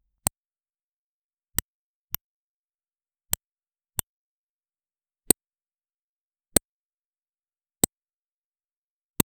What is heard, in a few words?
realistic slow roulette b, ball